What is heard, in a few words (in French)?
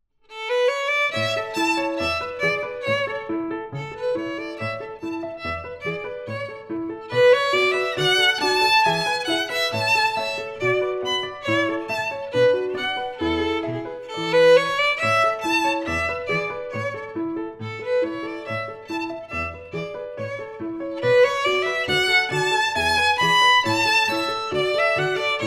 violon et piano; musique instrumentale; IUAV